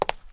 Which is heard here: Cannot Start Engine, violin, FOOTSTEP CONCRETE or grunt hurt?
FOOTSTEP CONCRETE